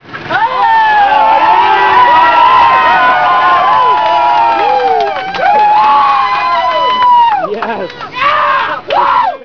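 Listen to the crowd as the guys spin and cause a pileup; The 2000 SPC Rally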